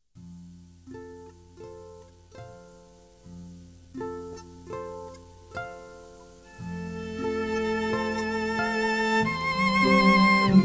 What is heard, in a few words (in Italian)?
ARPA E VIOLINO; arpa per matrimonio